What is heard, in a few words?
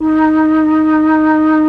FLUT, M400; ILIO; Propeller Island Legendary M400